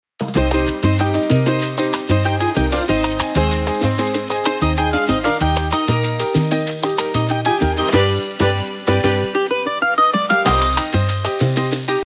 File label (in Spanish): Maracas